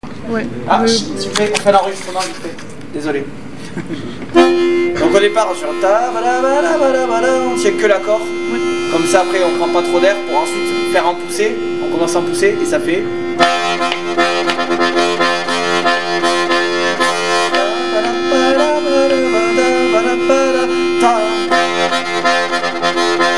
rythmique de Fa

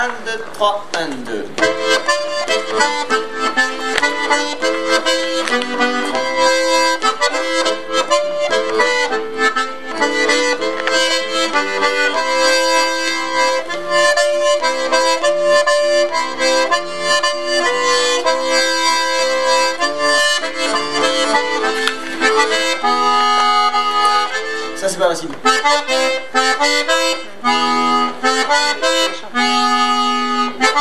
Voix 2 diatos

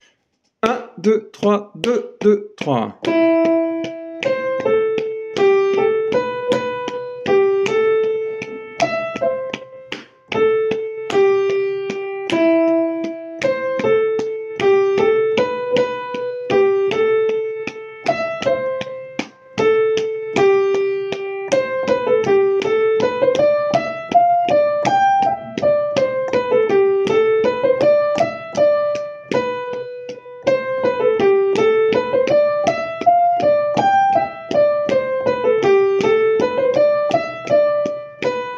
Am 3ème voix, Valse

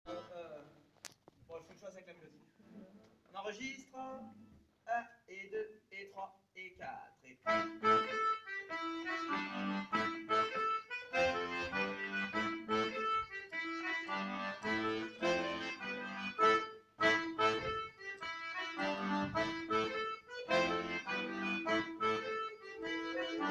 marquage diatos; Megastive; Musique d-ensemble; Cercle circassien

